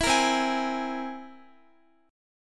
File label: C Augmented 7th, Guitar, chord; strummed; x,x,14,15,12,15